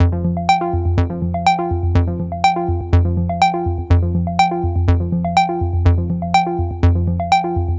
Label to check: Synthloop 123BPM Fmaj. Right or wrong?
Right